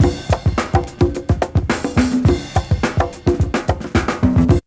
BREAK

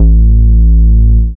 BASS; E-Lab; X Static Goldmine 1

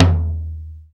TOM; E-Lab; X Static Goldmine 1

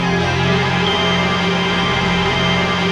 ATMOPAD; Best Service; XX Large; Pads

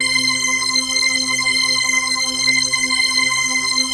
PHASE PAD, ATMO PADS; Best Service; XX Large

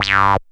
SYN TON, ARP SYNTH; E-Lab; X Static Goldmine 2; akai

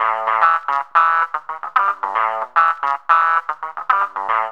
GTR LOP; INSTR LOP; E-Lab; X Static Goldmine 2; akai

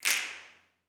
SNAPS, Gospel; Spectrasonics; Vocal Planet Vol.1 Gospel; akai

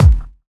BDRUM; MLOP DRUM; E-Lab; X Static Goldmine 2; akai

